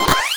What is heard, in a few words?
Item pickup; Megaplane